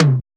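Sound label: 707 TOM MID, machine toms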